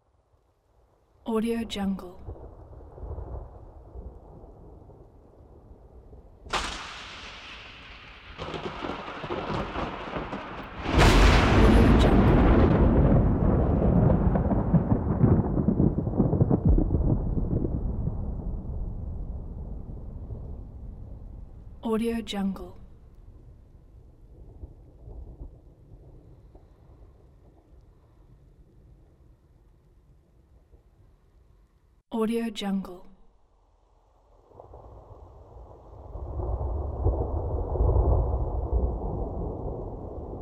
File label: thunder